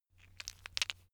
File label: stone gravel clatter; natur-tiere; steine